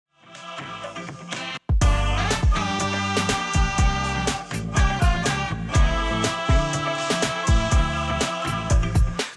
round ten sec count; music kits custom

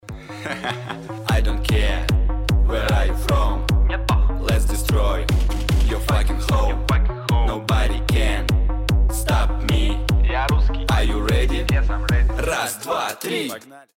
lost round; music kits custom

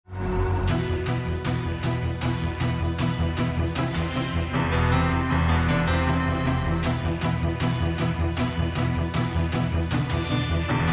bomb planted; music kits custom

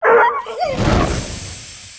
death

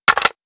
ak47 clip out

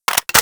ak47 clip in, weapons; ak47royal

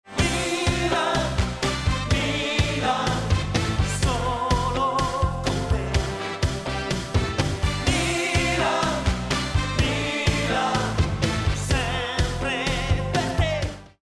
choose team; music kits custom

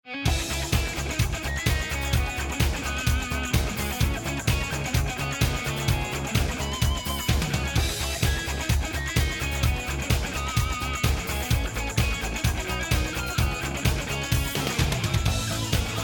bomb planted; music kits custom

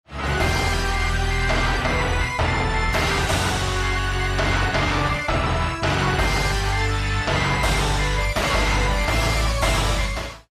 bomb ten sec count; music kits custom

